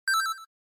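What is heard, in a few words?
Game Boy Racing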